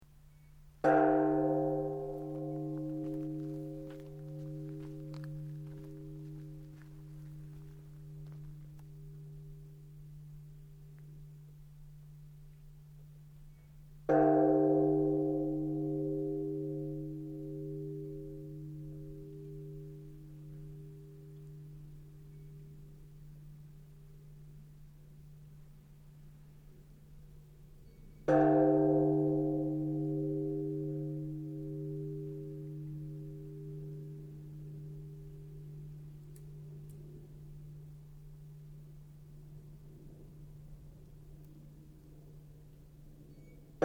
Bell Temple Bhuddist Sannohe Night, Temple bell rung every night at 8pm; Bells; Musical